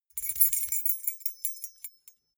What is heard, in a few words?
key ring small rattle air long